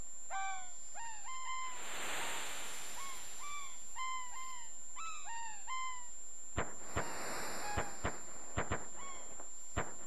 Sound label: pop pop